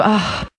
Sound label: "ah" relief, Voice